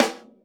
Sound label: RAW SD, Drums Acoustic; USB Soundscan vol.10; AKAI; 90s sample CD; ALL SD